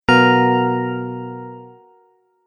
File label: Incorrect, dong sound effects; multimedia sound effects